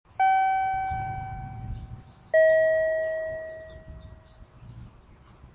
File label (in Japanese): 「ピ〜ン......、ポーン」豪華メロディー版, 鳥のさえずりのような音楽; 七隈線では列車が来たり、人が通行するとセンサーが感知し、鳥のさえずりのような音楽が２分ほど流れる。; 主に階段やエスカレーターに設置さ; 盲人用メロディー